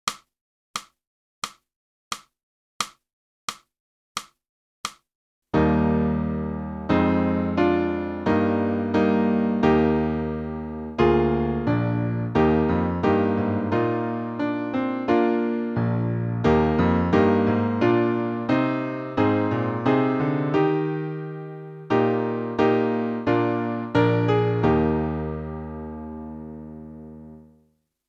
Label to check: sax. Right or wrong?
Right